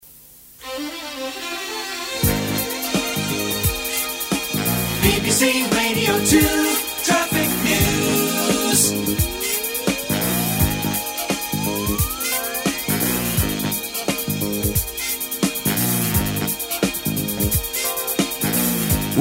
jingle

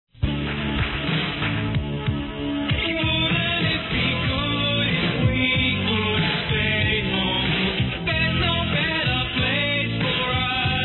jingle; gary davies

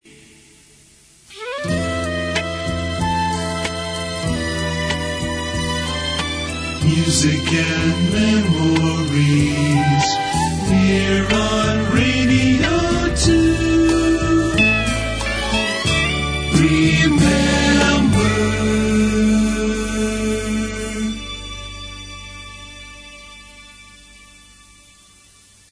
jingle

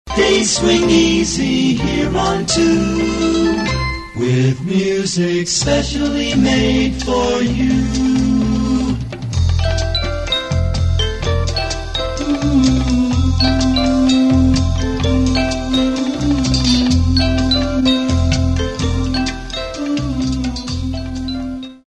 jingle

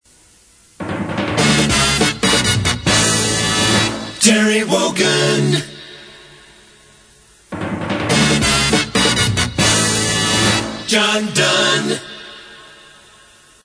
jingle

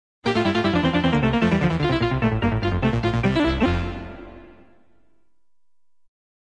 jingle

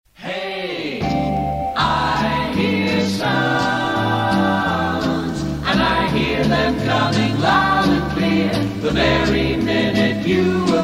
i hear sounds

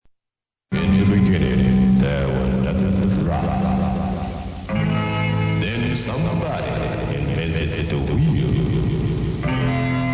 rock; rosko

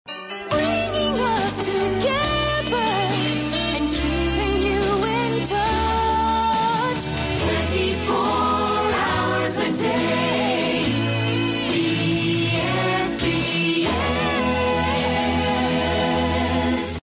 jingle bringing us together; bfbs

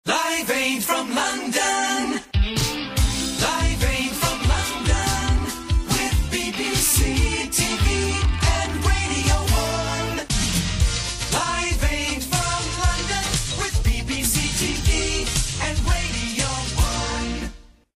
jingles; live aid

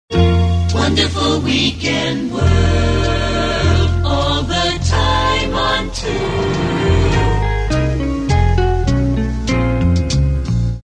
jingle

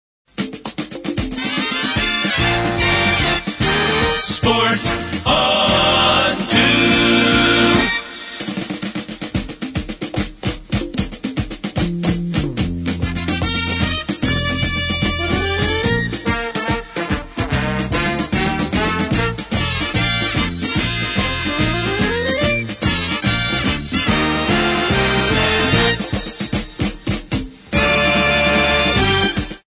jingle

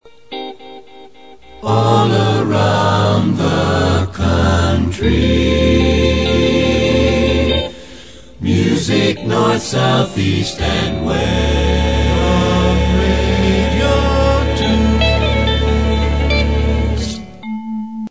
jingle

